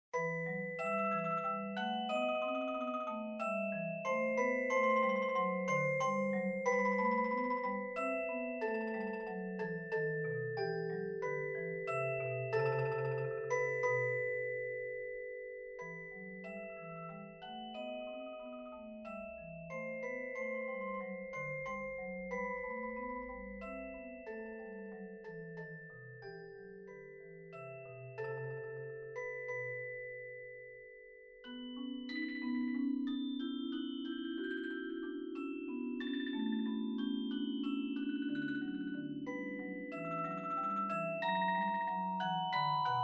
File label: Sarabande